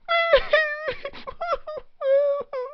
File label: crying; cuddles; htf